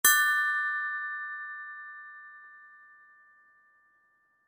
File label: Accent Glockenspiel Chime; Accent Glockenspiel Chime Maj Harmony; Mallet Sound Effects; Blastwave